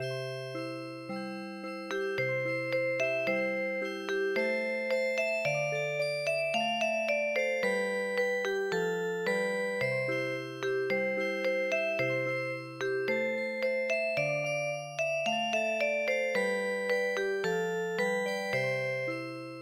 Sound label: Arroz con Leche Music Box